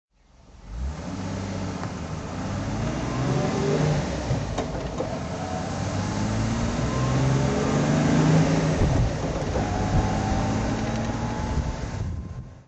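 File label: mercedes-benz 230sl drive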